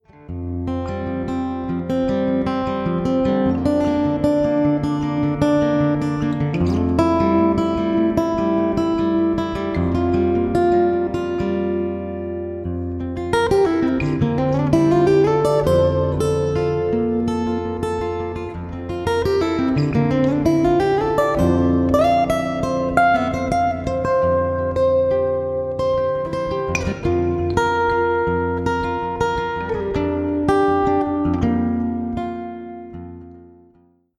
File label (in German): Gitarrist